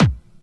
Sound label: hard bass drumz